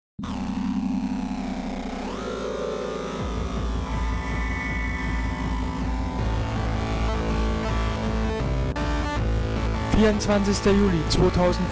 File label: jingle